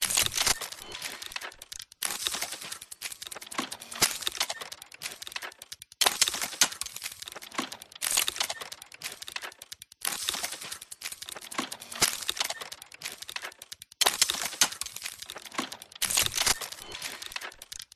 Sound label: Complex Mechanical Loops Gears Movement 120BPM Steampunk Tick; PMSFX